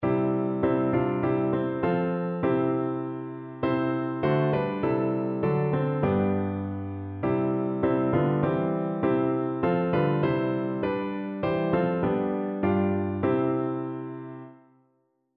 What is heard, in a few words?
a charge to keep, piano